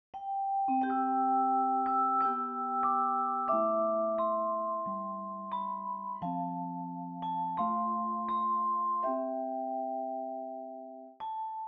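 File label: Vibra-thing, Pianoteq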